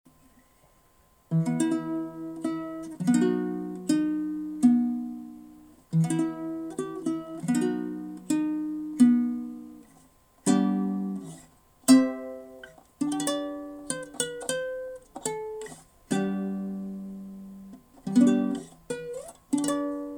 Bandurka Uke; Oj ne pjutsja pyva medy; Korala PUC-20

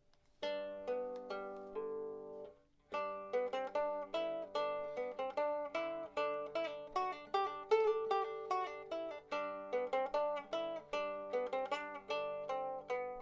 Bandurka; OKabanov

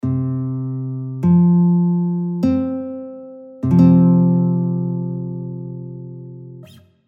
Sanshin F-strij Akord C F C

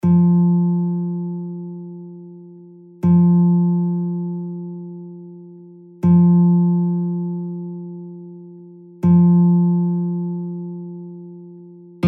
Sanshin F-strij 2 F3

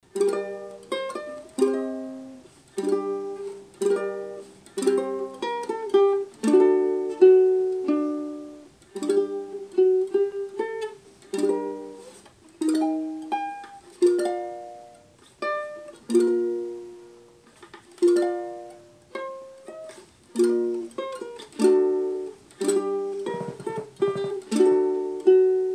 Bandurka z ukulele; BugsGear Travel BL-BK

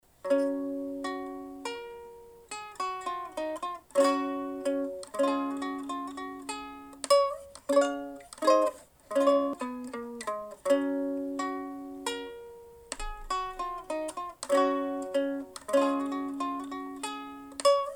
Bandurka Uke

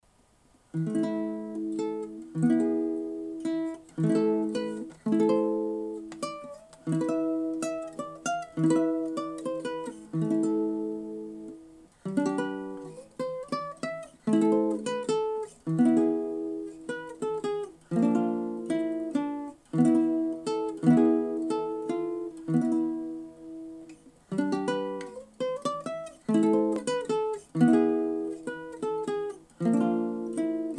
Bandurka Uke; Korala PUC-20

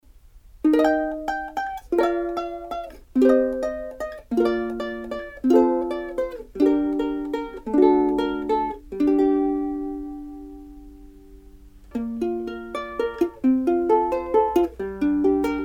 Bandurka Lanikai LU-21P Zvuk

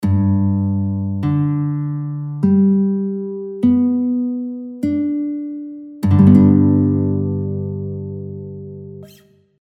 Bandurka G-strij Akord G D G B D; Акорд звичайного строю – соль-ре-соль-сі-ре, GDGBD; бандурки

